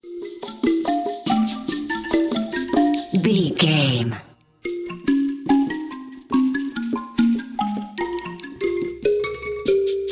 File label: rainforest expedition